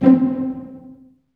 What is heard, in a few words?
STR SEC, STRINGS; Roland - LA Composer vol. 1